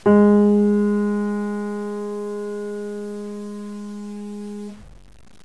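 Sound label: piano G sharp 2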